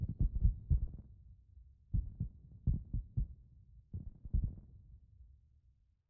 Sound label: gameshow loss